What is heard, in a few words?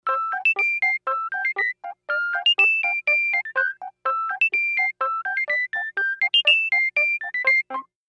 Toy Piano Play